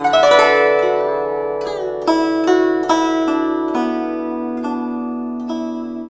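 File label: guzheng; Spectrasonics; Heart of Asia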